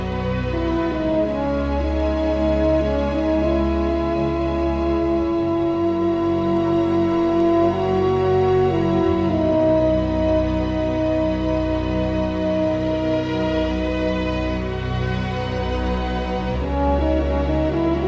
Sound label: (French Horn Solo)